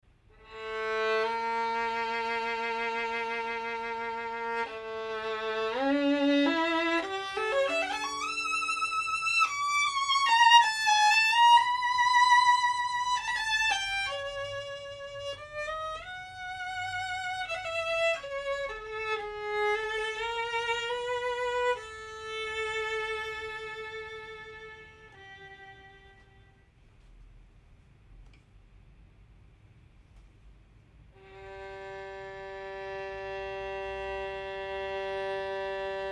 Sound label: Elite, Professional Violin